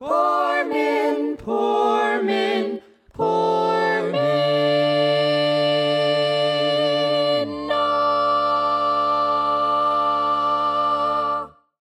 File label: Lead, barbershop tags